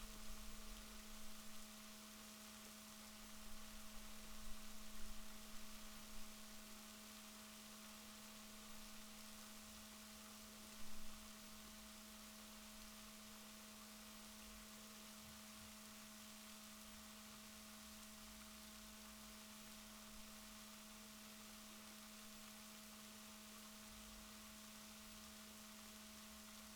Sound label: rorquals positif, Balaenoptera Physalus Chile